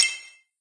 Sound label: Combo Sound; candy crush saga gamerip